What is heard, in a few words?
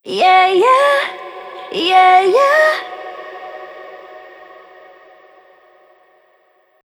vocal phrase short yeah wet 140 Gbmaj